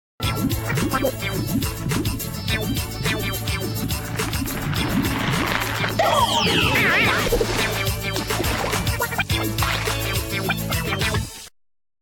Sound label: jingle; bbc